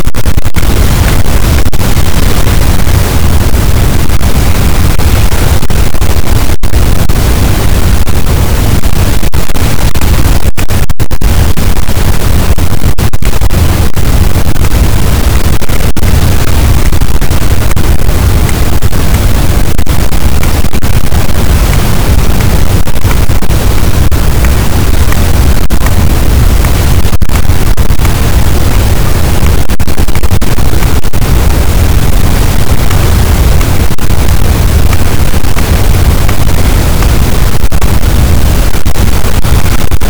cachalot positif, boat, Physeter Macrocephalus

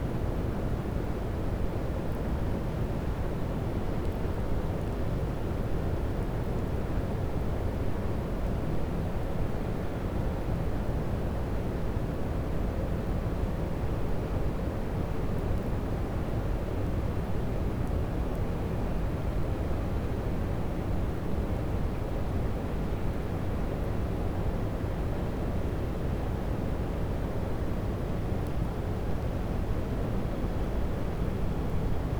cachalot negatif, Physeter Macrocephalus

